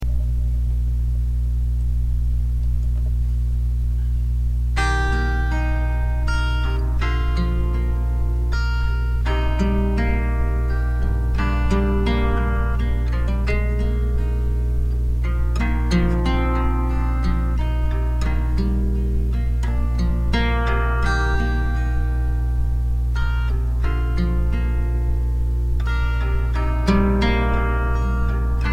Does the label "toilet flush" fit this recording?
No